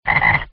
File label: frog